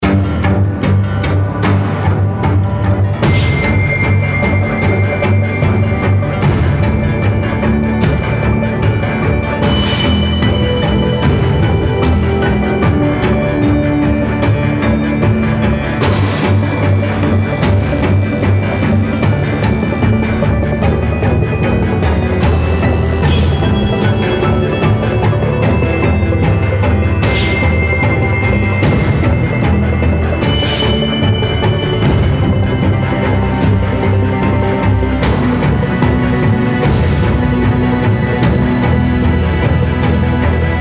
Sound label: Action (Loop)